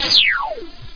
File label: Right Left Lane; Loony Labyrinth